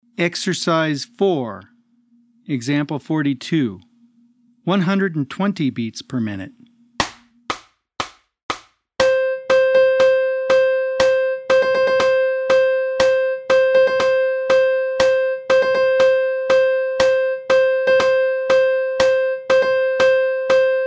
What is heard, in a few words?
120 BPM